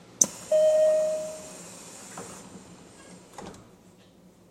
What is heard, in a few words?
nishitetsu7000, door